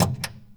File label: Games, Arcade, Pinball Machine, Power On Off Switch, Steady, Exterior; DeltaVR; FMOD